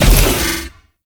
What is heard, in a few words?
Bowl Jump; Warframe; Grendel; Grendel Deluxe